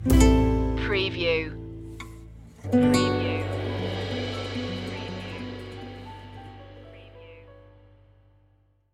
latino stinger

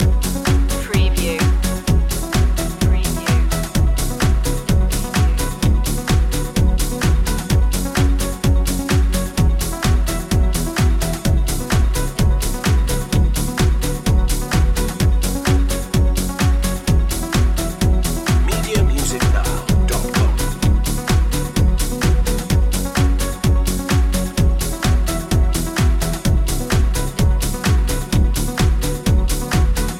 time piece loop

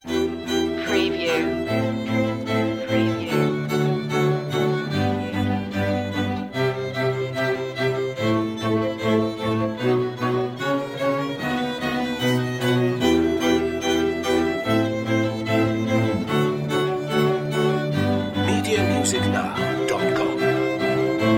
strings quartet

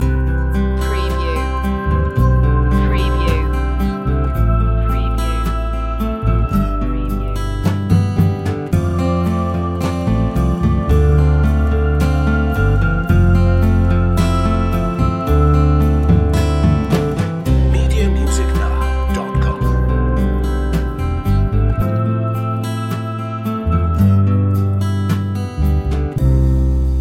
acoustic ocean

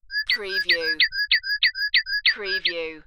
Bird Chirp Aln

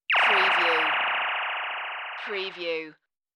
Long Lazer

